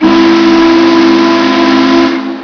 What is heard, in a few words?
hancock 3 chime